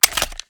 reload xmagslrg mag out; gmod

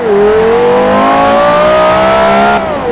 second, vehicles; carreragt

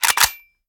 reload empty fast charge; reloads; gmod; iw8

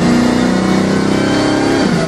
second, vehicles

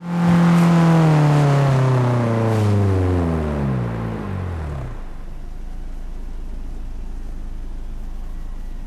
throttle off, vehicles; gmod; tdmcars; s2000

